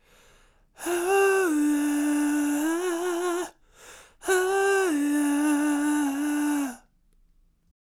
Soul Vocals Am 140 BPM, Melodies; 99Sounds x Monosounds

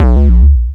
sub, sub bass; Techno ID; subbassdrums

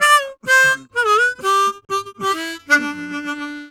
Harm 129bpm G, Harmonica

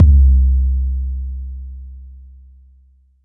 Jungle Kick, Roland SH-32 Drumset

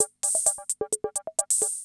CR78

